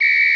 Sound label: Whistle Hi; Instruments